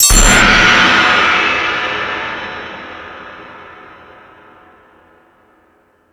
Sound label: Crash 2, drum machine; E-MU Pro-Cussion-1